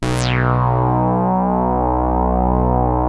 SAW RES WET, POLYRES SAW2, Synth; InVision Interactive; Keith Emerson; The Most Dangerous Synth and Organ

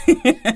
LAUGH; E-MU Formula 4000 Series Vol. 1; Hip Hop Nation; Raw Phatt

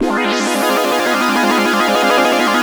TRILL, Korg; Back In Time Records; Korg Universe VOL-1; FX FAMILY

